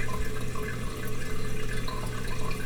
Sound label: toilet running; KINGPIN